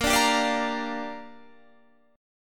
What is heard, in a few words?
A sharp 6th, Guitar Drop D